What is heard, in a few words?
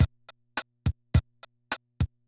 Loop, classic machines, rpm 40